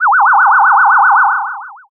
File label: ufo